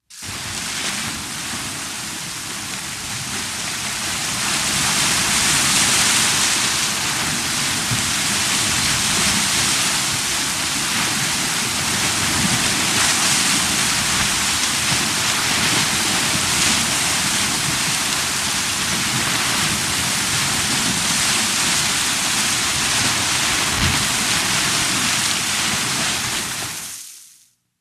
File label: WATER - SPLASHES & SPRAYS HOSE: INT: Fire hose spray, very heavy spraying.